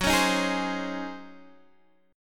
Db Major 7th Suspended 2nd Suspended 4th Gb, chord